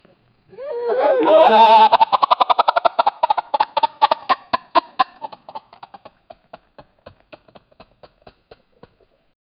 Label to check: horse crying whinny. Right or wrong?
Right